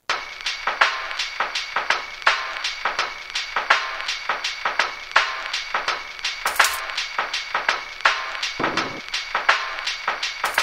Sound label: Caveman 83; Roland M-DC1